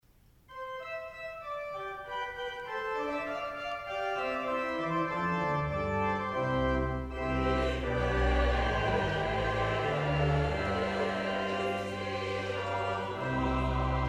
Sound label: We Praise Thee, O God, Chancel Choir